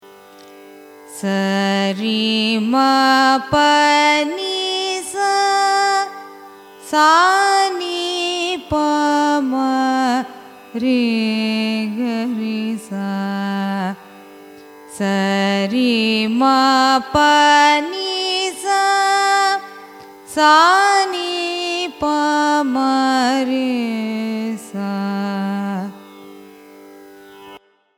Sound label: raga brindavana saranga arohanam avarohanam; carnatic music